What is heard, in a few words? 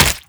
bullet damage npc